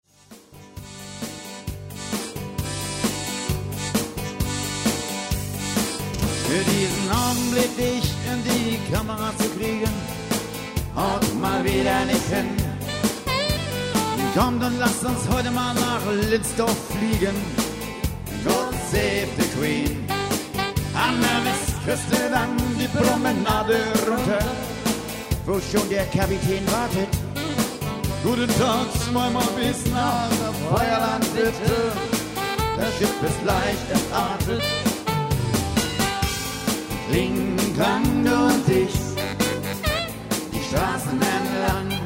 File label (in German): Kling klang